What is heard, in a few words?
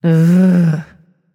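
sigh, female voice; smite; voicepack; hera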